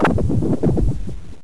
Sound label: slime; Monster girl quest